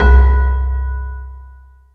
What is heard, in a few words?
CELESTA, JV-1080; Syntec; Wall of Sounds VOL-2; ORCHESTR-EXP